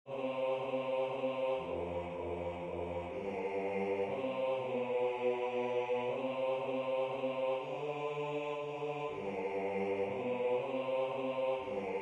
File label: Bass